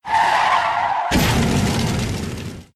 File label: Logo; Burnin' Rubber 5 HD